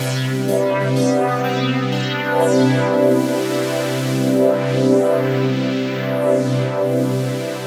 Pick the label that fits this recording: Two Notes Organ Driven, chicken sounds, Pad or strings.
Pad